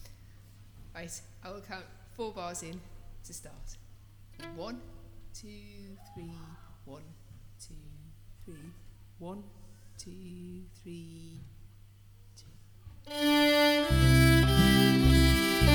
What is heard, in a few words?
tune harmony guitar and bass; Orange in Bloom; Out on the Ocean